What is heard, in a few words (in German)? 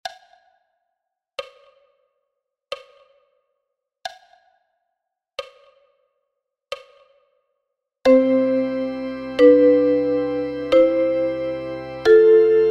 Choral chrom und Git Sound, chrom. Mundharmonika & Gitarre; Bach